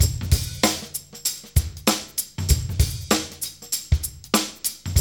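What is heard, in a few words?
96 bpm, Funky Drums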